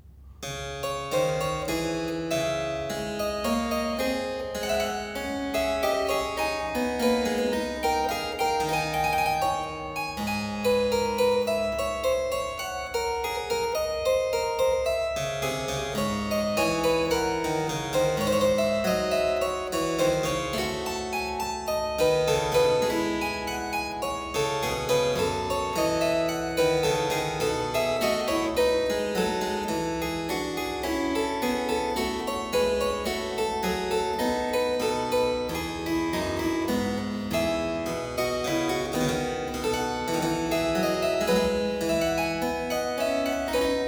Dreistimmige Inventionen in D minor, Keyboard Works; BWV 790; J.S. Bach; Inventionen & Sinfonias